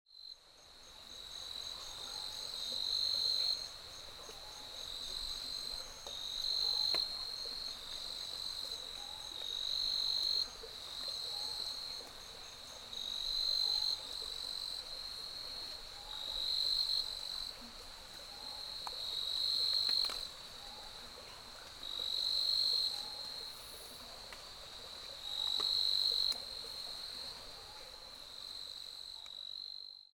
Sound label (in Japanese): kutukikoorogi call; yanbaru